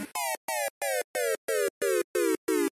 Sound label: death sound; alex kidd in miracle world